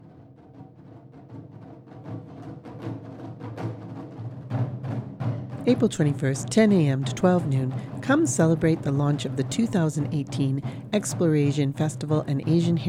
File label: taiko; explorasian